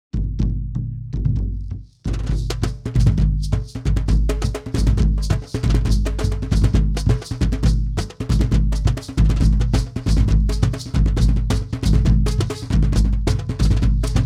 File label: Instrumental, The Black Caribs Of Belize; Charikanári